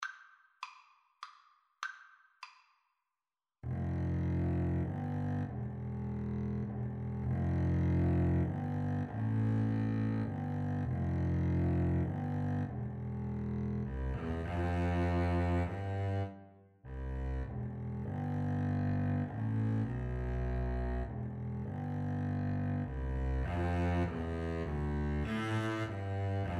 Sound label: Double Bass Duet